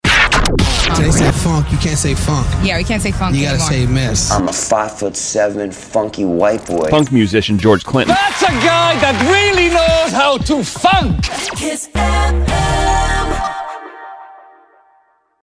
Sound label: funk sounder